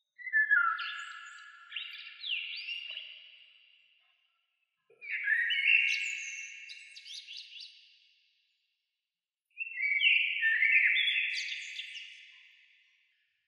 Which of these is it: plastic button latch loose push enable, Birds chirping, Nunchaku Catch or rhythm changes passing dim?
Birds chirping